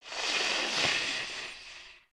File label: baby spider idle; creatures mechanical